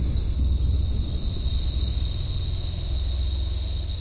idle B mode, weapons; divine titan

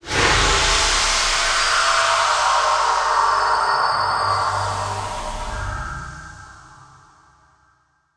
charge idle, weapons

